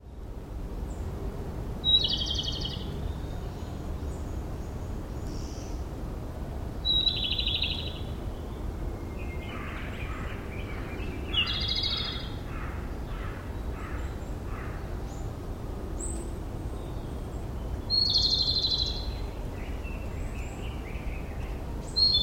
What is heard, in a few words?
Japanese Robin